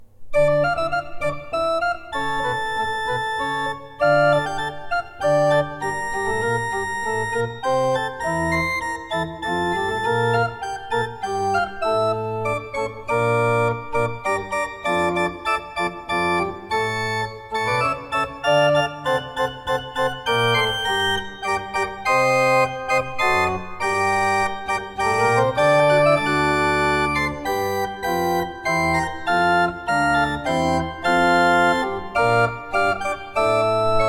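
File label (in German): Tzschoeckel 5 I, pfeifenorgeln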